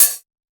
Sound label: Open HH, PsyTrance; Cymbal